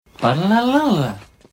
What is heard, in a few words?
balalalaalala; taunts; props